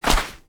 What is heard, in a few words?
sand footstep; metrocraft